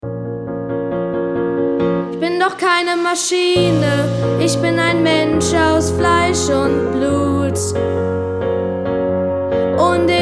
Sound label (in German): Singen